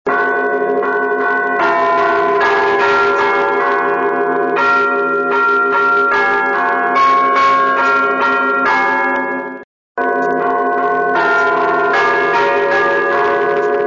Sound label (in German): Grosser Gott, glocken; toene